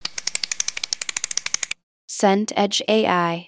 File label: mouse scroll wheel